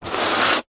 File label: industrial sanding large piece of wood sand paper single